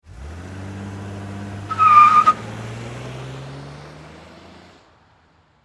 Vehicle Auto Drive Away Tire Squeal Mercury Sable Automobile Car